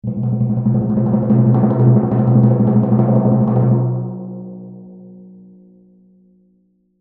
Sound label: Tympani Drum Hit; Hit Sound Effects; Musical; SoundEffects-BlastWave